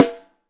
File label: SN Top, Snare